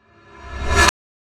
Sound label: Reverse Fx; musicradar